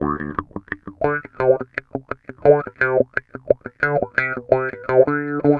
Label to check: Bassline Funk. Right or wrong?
Right